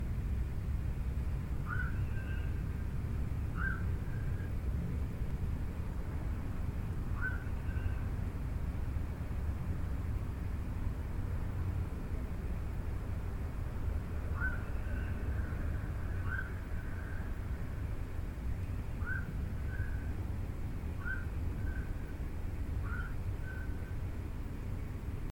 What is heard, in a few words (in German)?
nachtreiher